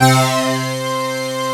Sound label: Rez Synth C2; Proteus 2000; Digital Sound Factory Proteus 2000